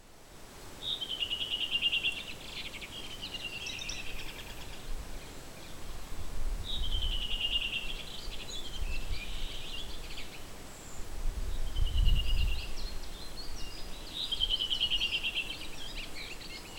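T iliacus song 2 ind, background Ph collybita F montifr T pilaris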